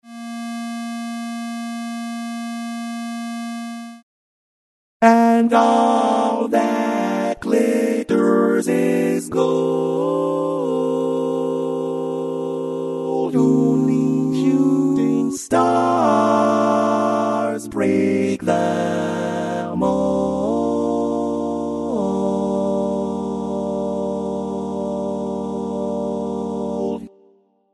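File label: Baritone, Barbershop Tags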